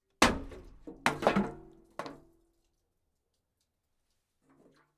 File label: water can fall